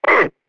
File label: die